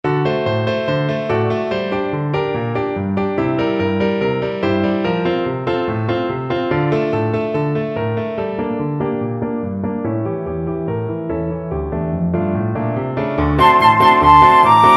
oh mother, flute

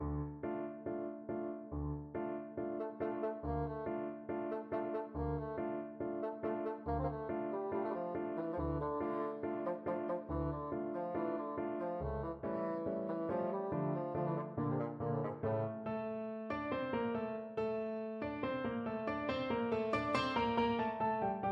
barber seville overture, bassoon

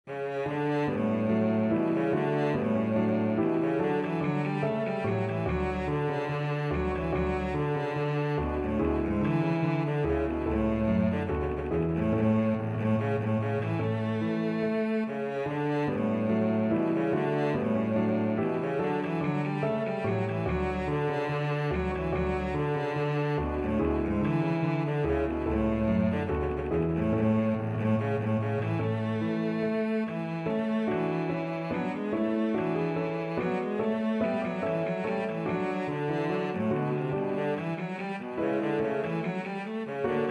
march in d, cello

